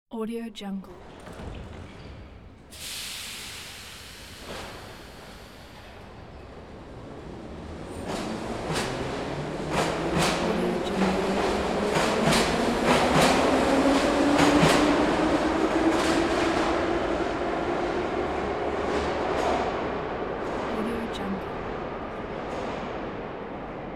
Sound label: subway